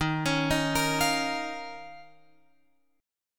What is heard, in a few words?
D sharp 7th Suspended 2nd, Guitar, Open F; D#7sus2; arpeggiated